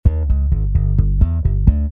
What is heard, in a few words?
Bass E,D 131, Electric